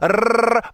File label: brrrrrr, Voice